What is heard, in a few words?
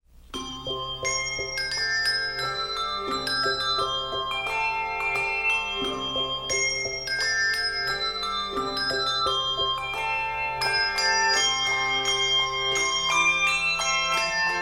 Handbells, Handbell Music; When I Can Read My Title Clear; Publisher: Jubilate Music